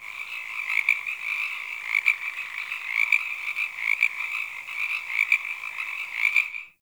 Beach Night Frog; Warframe; Grineer Beach

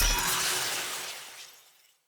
Short Sword Recall Button Push; Weapons; Player

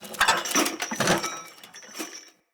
King Death Foley Accent; Characters; Enemy; Mendicant; MndKing

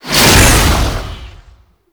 Infested Melee Swing; Oni

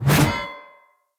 Two Handed Mace Whoosh F; Weapons; Enemy; Blunt; Sinecure Two Handed Mace

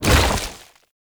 Tank Infested Arm Move; Warframe; Lasrian; LasrianTank; Movement

